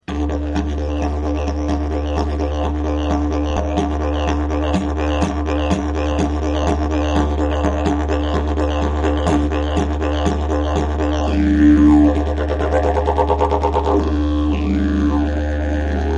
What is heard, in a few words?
didgeridoo